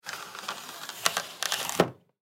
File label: Height Measure, Height Measure Adjust Bar; Hospital; Emergency